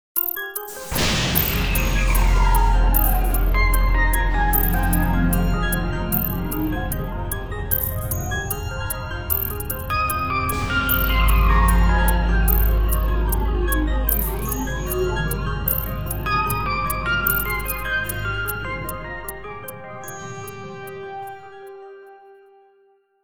Space video ringtone; Nokia N79; Audio themes

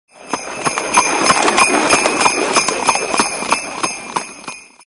Sleigh ride, ringtones

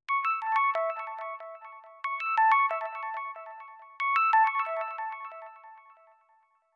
Breath of Fresh Air, ringtones; Samsung Galaxy E5

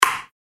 bounce

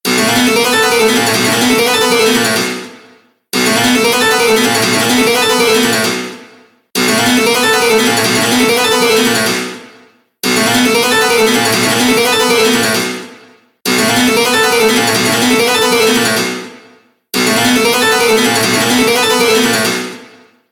Cembalo, ringtones; LG; P940 Prada 3.0

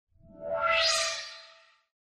Message Send

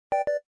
call disconnect, phone tones; LG GD570 dLite

